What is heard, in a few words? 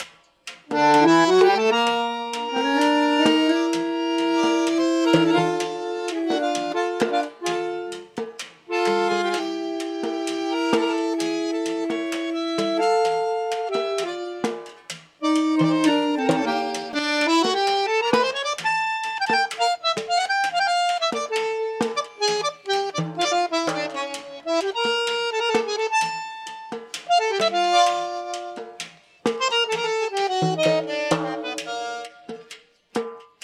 Accordion + Drums